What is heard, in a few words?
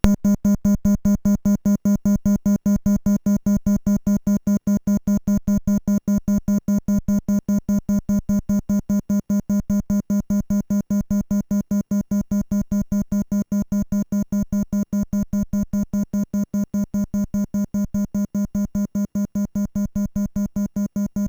2A03 triangle volume